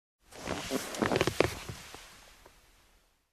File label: Long single leather creak